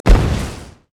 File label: tower; LoL